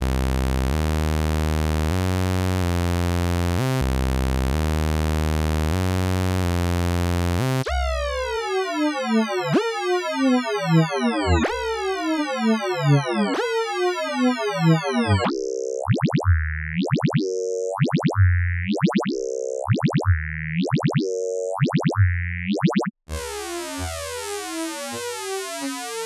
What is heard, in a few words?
Mono Synth; Extremum